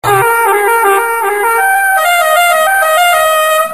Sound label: Sounds Car Horn; Caddy Shack; Air Horn Super Mix Sounds Musical Car Horn